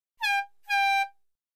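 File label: Football Air Horn Blast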